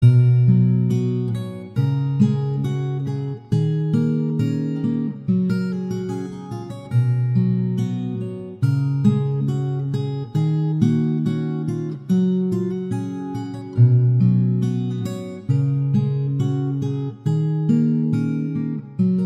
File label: Guitar; Instrumentalny; dzwonki na telefon